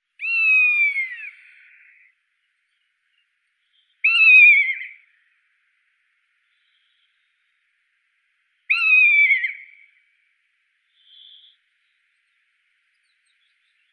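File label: hen harrier, Circus cyaneus; lun polevoi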